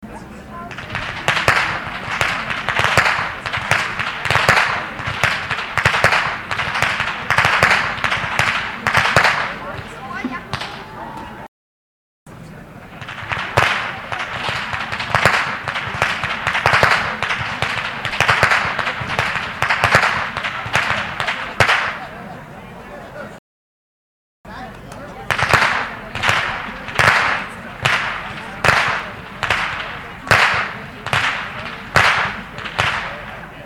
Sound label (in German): Schnalzen; Perach; Ainringer Schnalzen